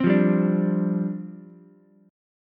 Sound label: G 7th Suspended 4th G down Ukulele; G7sus4 strummed